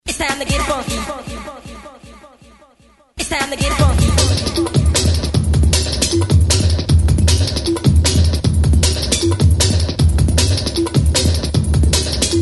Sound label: Funky Beats; Skeleton Records